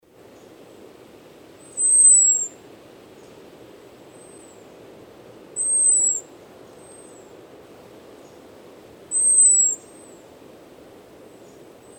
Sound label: Robin